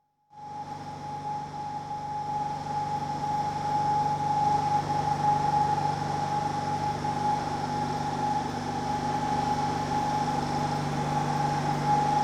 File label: Surrealistic Noise